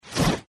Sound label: wheel out